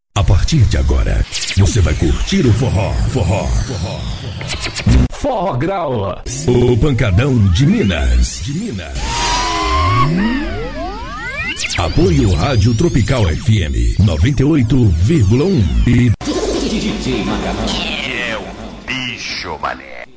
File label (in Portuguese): abertura forro